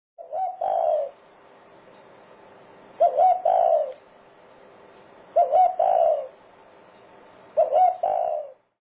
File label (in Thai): นกเขา